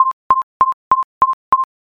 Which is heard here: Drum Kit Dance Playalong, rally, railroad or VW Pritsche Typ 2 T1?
railroad